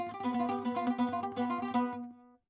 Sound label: saz